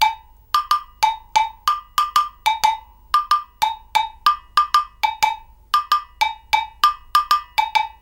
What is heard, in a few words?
Agogo